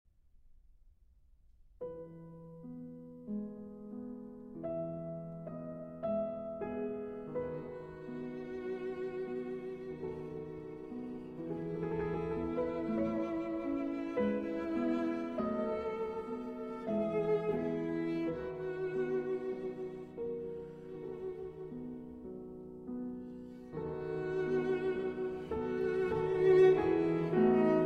Sonata For Cello and Piano in A Major, Adagio Cantabile